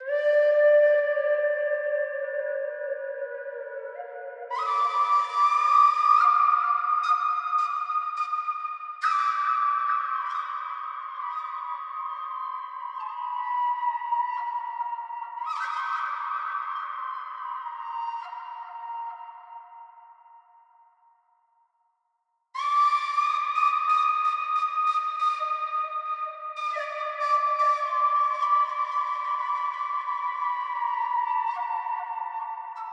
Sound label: Flute; raji an ancient epic